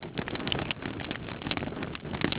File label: fire torch; graal2001